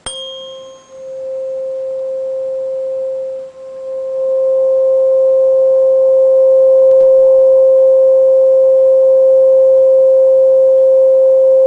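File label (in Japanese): 528 Hz, 音叉; 第3チャクラ 黄; 理想への変換、奇跡、細胞(DNA)の回復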